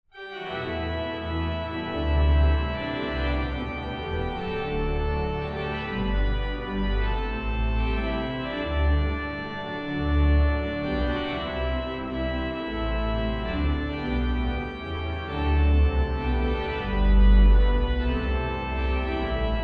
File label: improv toccata Postlude